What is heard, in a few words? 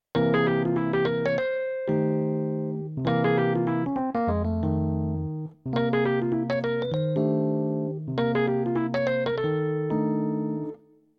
7th, jazz piano